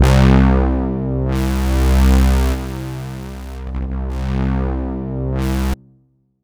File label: Roland JV 2080 JUNGLE BRASS C1